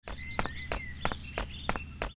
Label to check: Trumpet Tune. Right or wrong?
Wrong